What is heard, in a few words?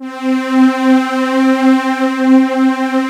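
strings synth C3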